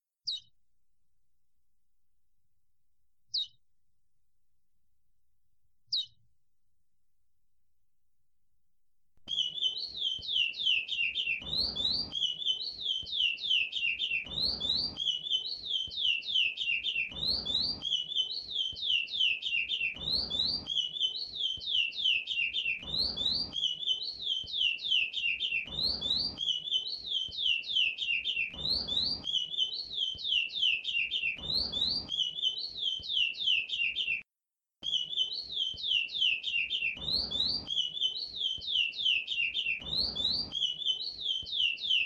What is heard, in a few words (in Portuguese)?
Canto Curio Vo vo Yi viu